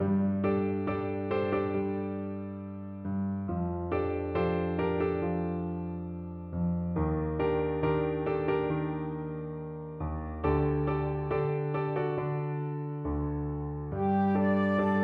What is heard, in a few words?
Moved Flutes; für zwei Flöten, Bassflöte und Klavier (2003/14); Lento e rubato – Allegro giocoso